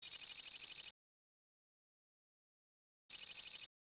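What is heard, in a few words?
cockpit screen loop, ambience; Star Wars Jedi Knight II Jedi Outcast; XBOX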